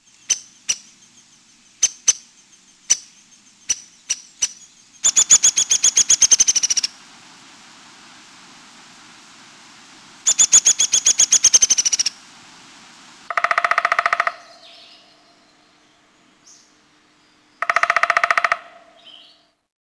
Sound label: downy woodpecker